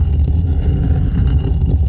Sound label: Scrape Stone Loop; Doors